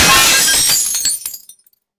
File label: violent glass shatter